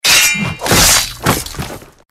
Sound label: Sword Attack